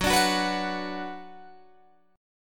Gb Suspended 4th Sharp 5th Gb, down, Guitar, Open G